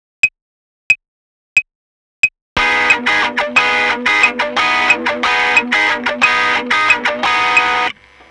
裏拍

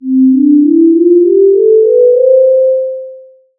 ストリングス

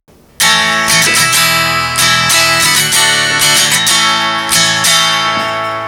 生音

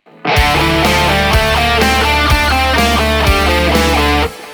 ナチュラルマイナースケール; guitar; scales

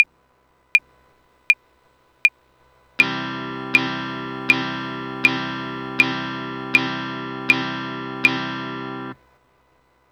ダウンストローク

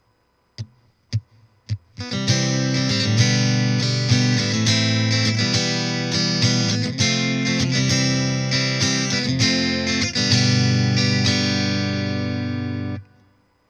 アコースティックシミュレーター

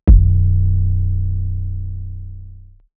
キック

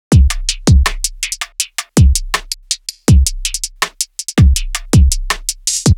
ハイハット

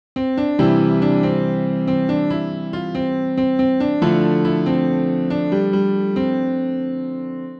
メロディー

